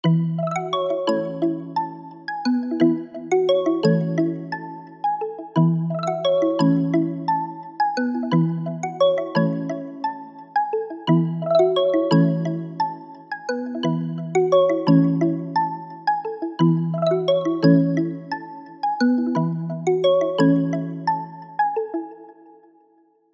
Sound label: MOBILE RINGTONE